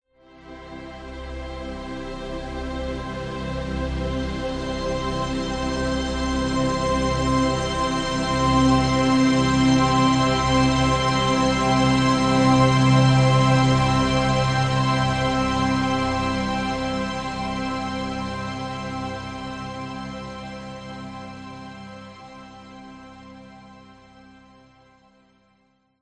sound design cinematic swell warm epic wondrous beautiful chords; zapsplat